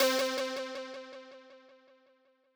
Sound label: lead